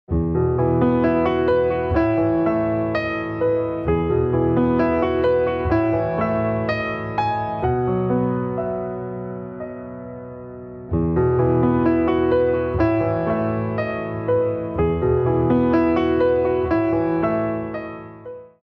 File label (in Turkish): santral fon muzikleri